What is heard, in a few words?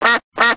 dog finds; DuckShooter